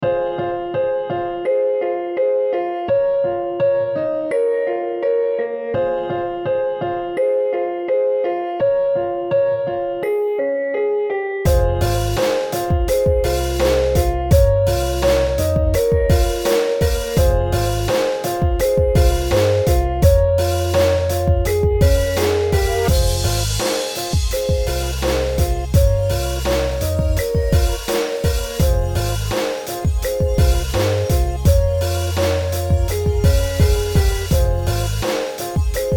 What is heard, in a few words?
Dark Background Music; Danko Scientific